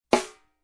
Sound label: Snare Hit